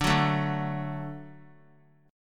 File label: D Major D down, D Chord; D strummed; JGuitar